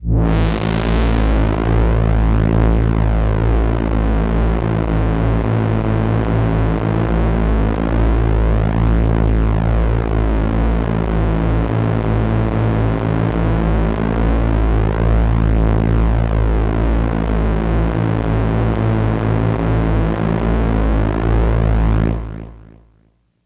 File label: drone